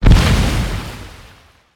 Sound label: damage hits explosions, Warships